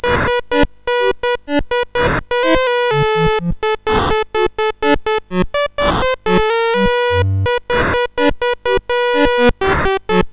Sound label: MOS6581R4, GAMES; Trashman